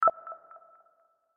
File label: Ding; Upwork Dash